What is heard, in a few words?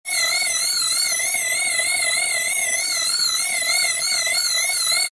alarm